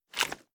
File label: raise first start; reloads; gmod; iw8